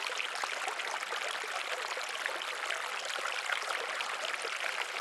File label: Stream, ambience